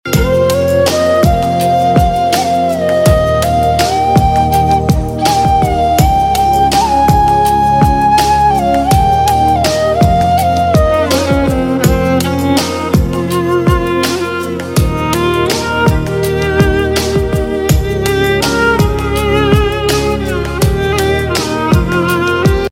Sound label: Instrumental